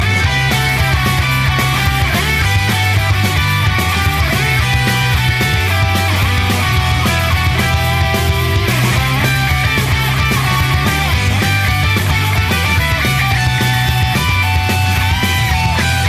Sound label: Guitar Bass